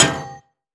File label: frag physics bounce metal hard; gmod; viper